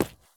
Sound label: sprint asphalt; Escape from Tarkov